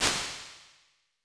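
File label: Bright & Empty, Impulse Responses, Rooms; 1.6s; Medium Spaces; Apple; Bight & Empty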